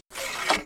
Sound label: Reload; WarRock